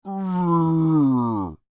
Creature, Human, Moan, Med; Groaning; Sound Effects:Voice; Big Room Sound

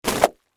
Splat Wet Towel, Drop; Texture; Drops; Sound Effects:Objects; BlastWave

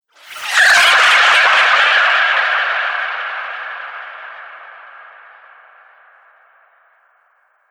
Alien Slurpy Atmospherics

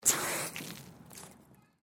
Water Garden Hose Faucet Squirt Lite Suction; Yard Tool Sound Effects; Big Room Sound

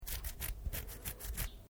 MALE SCRATCH ARM; Miscellaneous Human (Sound Effects:Human)

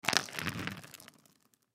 Mousse Can Roll; Hair Salon Barber Household; Foley; Primping; Human; Blastwave

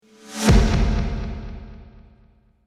Trailer Hit Swoosh; Miscellaneous Impact Sound Effects

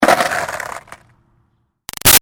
Skateboard Hit Landing Short Roll; Skateboarding Sound Effects; Sports

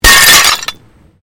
Glass pane puncture; Material components for constructing more complex sounds

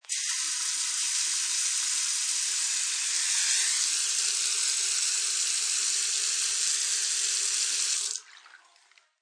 Faucet, Bathroom faucet running; Bathroom Sound Effects; Household

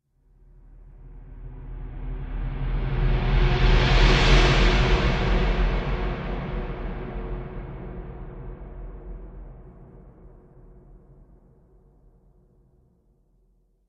Trailer Riser Cinematic; Trailer Sound Effects

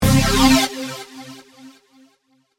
Synth Stab, Synth, Square, Wave, Blip

